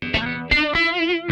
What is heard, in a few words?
Funk Guitar; 90 s Sample CDs; Zero G